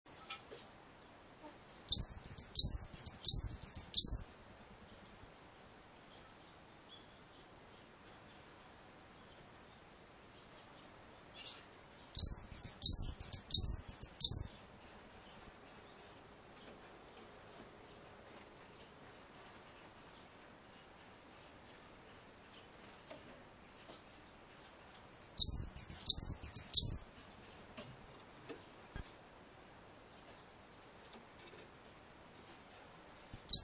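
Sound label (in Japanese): yamagara, varied tit; 山雀; ヤマガラ; 山雀のさえずりです。; 標高750ｍ〜850ｍぐらいの田園地帯（里山）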